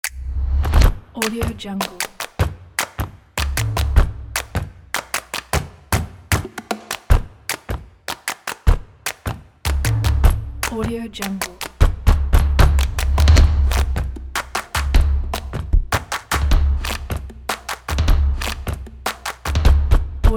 stomps and claps happy vibe

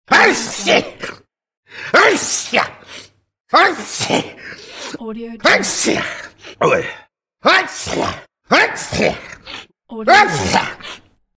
male sneezing

